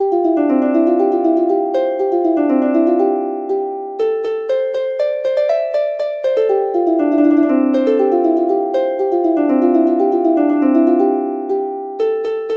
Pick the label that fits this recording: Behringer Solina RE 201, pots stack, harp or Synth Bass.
harp